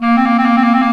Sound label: THE CLARINET